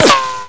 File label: ric, bullets